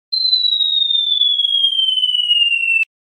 silbadores

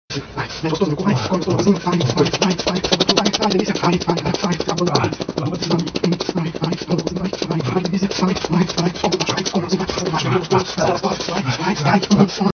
helicoptero

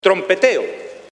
trompeteo

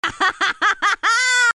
risa

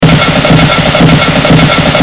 ak47 alarma

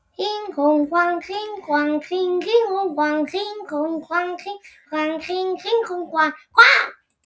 himno chino

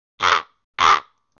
cuervo cachondo, cuervos sonido

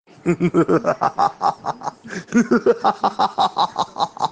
risa

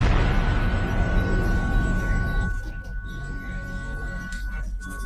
suspenso

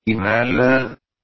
inhala

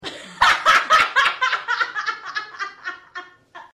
risa

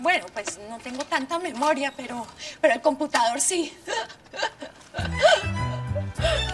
risa

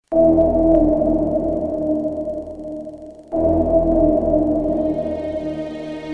suspenso sonido

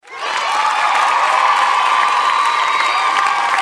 aplausos eee

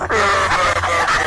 risa demoniaca

